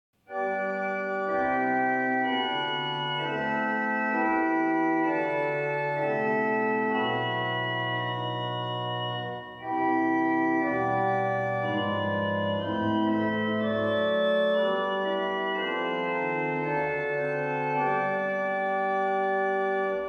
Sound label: Chorale Preludes; Reger; 30 Short Chorale Preludes; 8 The Day is Surely Drawing Near